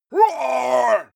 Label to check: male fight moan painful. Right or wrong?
Right